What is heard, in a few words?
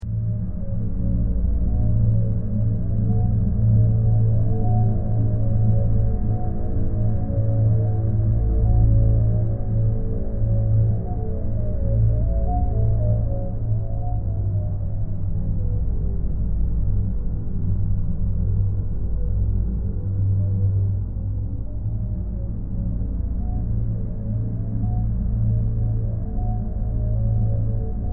ambiance